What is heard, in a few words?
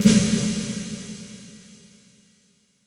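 PCM60 snare drum plate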